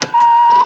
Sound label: keypress